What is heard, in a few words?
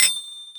charm get; Fate The Traitor Soul; ITEMS